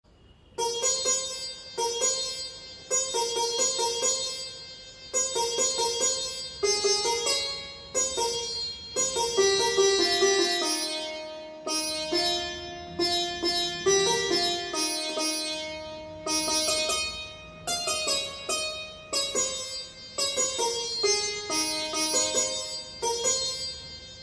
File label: best ringtone, bollywood songs ringtone